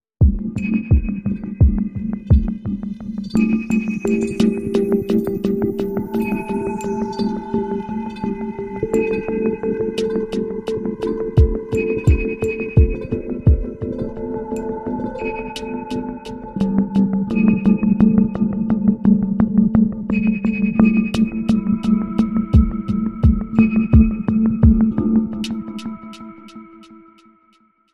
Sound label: Ambient Hush